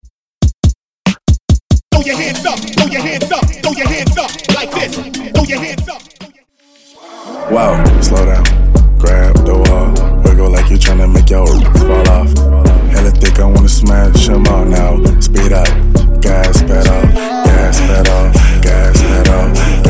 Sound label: Hooks Redrum